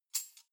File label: cutlery knives handle short